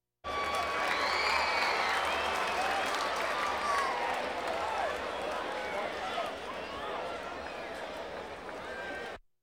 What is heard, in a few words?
Crowds Race cars Baseball